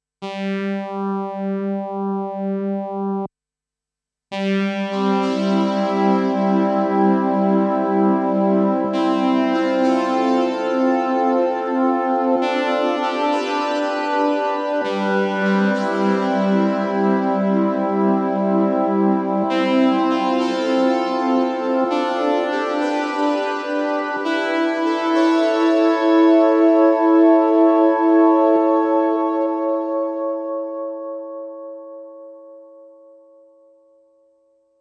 synthesizer; eventide h90

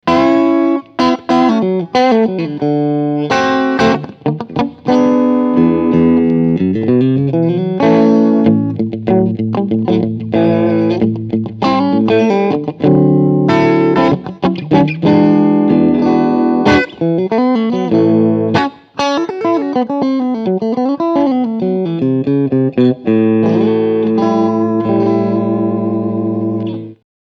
HB und Mid SC Clean

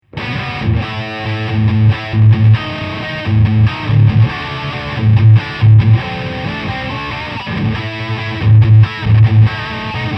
Lead

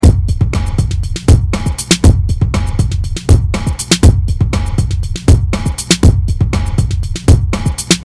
Compressor Gate; Treshhold 107 db

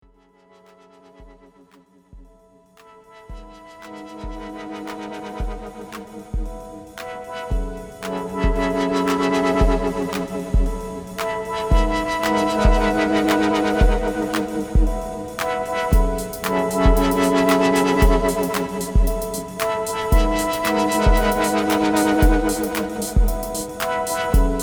Pad Motion Seq osc shaping

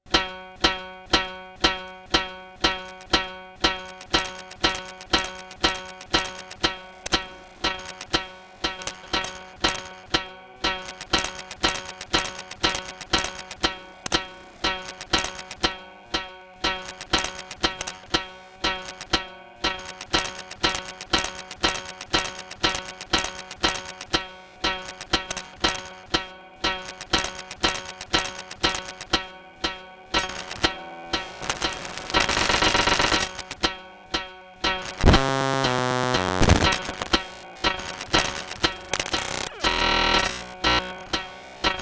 Anamono Xmini Granulator; Gotharman; Bulbul

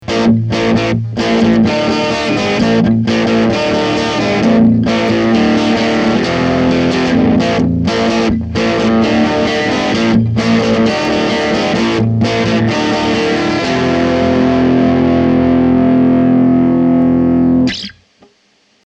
Amp R-121

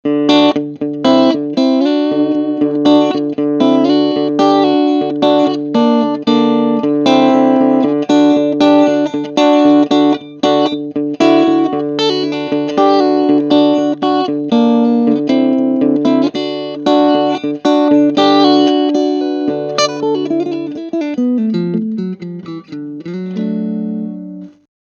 Clean Singlecoil Picking

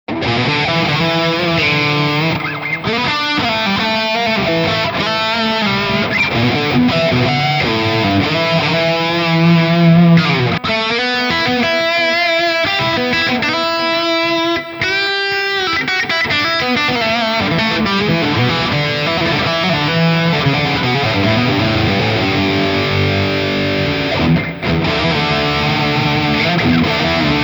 Valeton GP 200 Boogie Dual R.

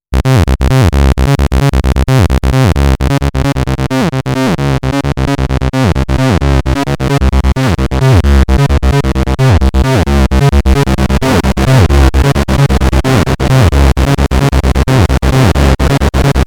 OSC Mix

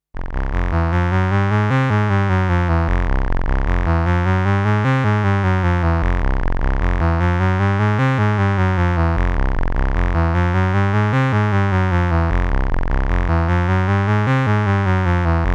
Arpeggio

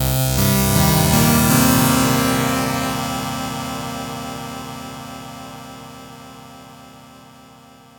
Osc FX

